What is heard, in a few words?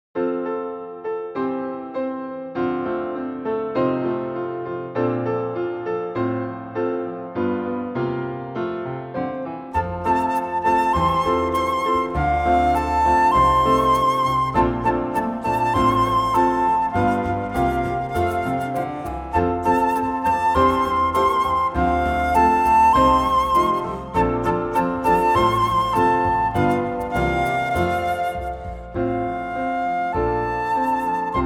Flute